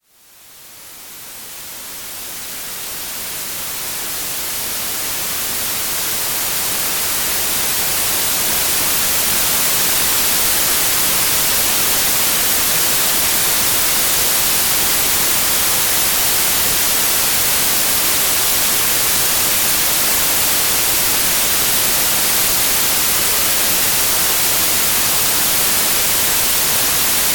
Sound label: white noise; Eye-Bo, система аудио-визуальной стимуляции